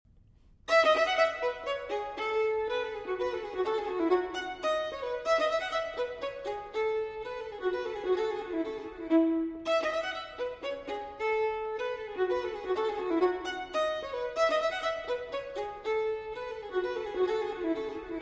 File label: Solo Violin in F Major Allegro; No. 11; TWV 4024; Telemann Fantasia